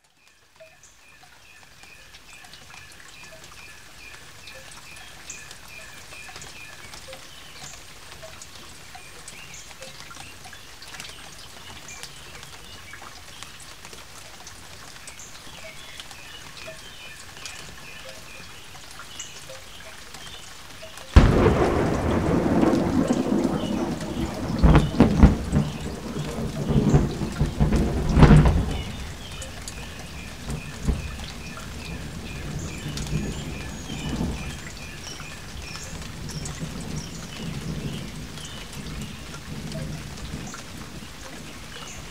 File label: Back Porch Rain